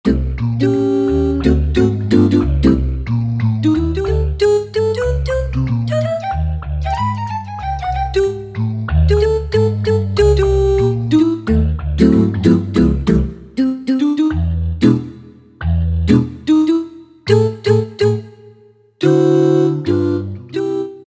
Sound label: Voice Oohs, Roland SC-50 Sound Canvas; Factory sounds